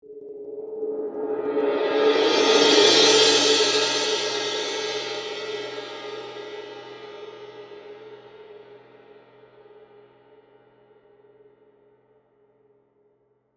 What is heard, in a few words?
Cymbal Suspended; Musical